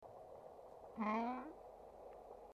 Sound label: calf call; Parks RW